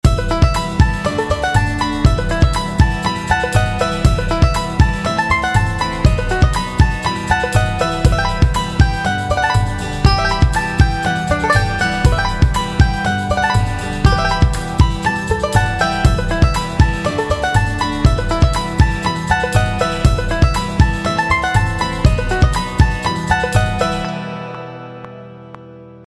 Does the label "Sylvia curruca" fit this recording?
No